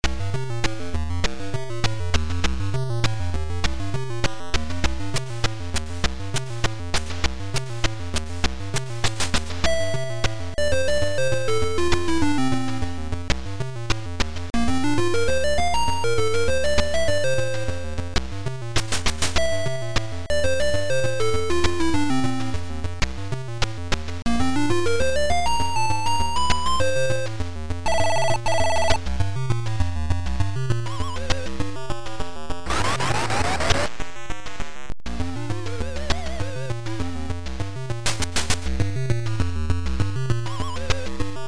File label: Music Electro; CRTC Music 1